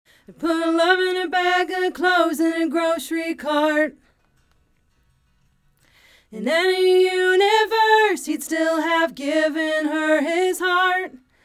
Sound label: Backing Vocals Dry